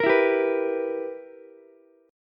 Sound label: B Major 7th Suspended 4th Sharp 5th Ukulele, chord; BM7sus4#5; strummed